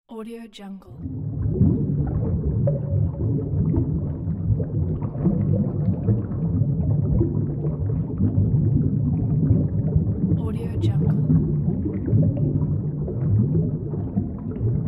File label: diving